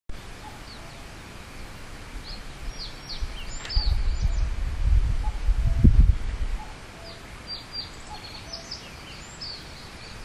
kakkou

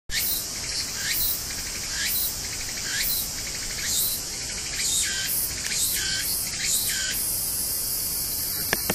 tukutukuhoushi; 鳴き声

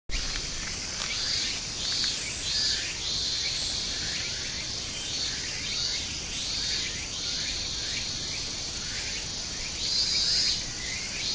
minnminn tukutuku

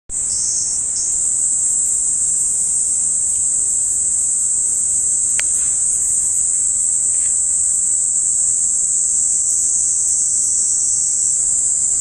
semishigure

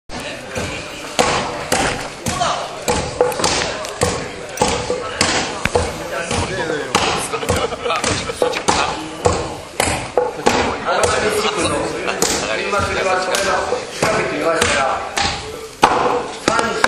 motitukinooto

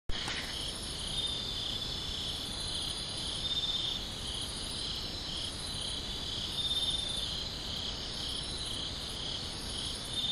mushinokoe

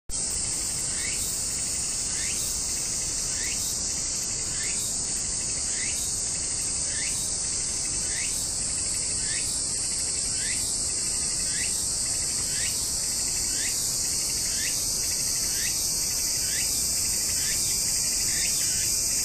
tukutukuboushi

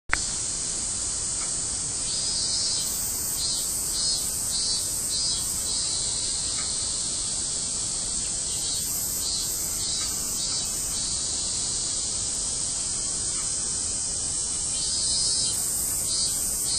minnminnzemi; ミンミンゼミの鳴き声

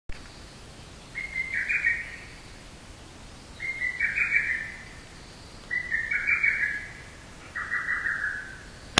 hototogisu

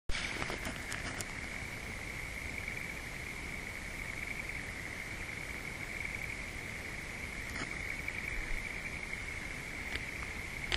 kaeru nakigoe

